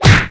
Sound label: Big Punch; Knight Online